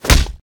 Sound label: flesh; combat; flurry; CosmicRage